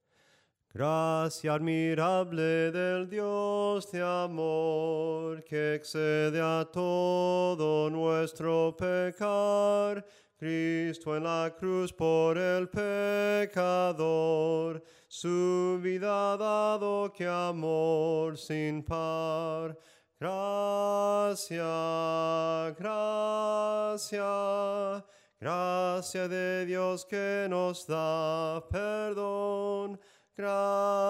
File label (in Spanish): SOPRANO CANTADO; GRACIA ADMIRABLE